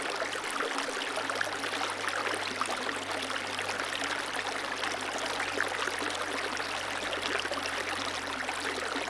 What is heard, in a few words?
river